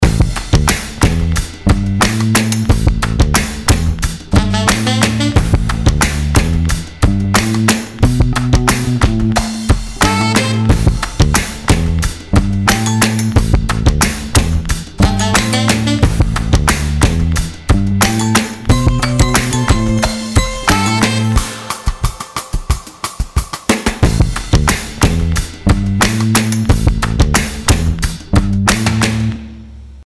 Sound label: Double Drummer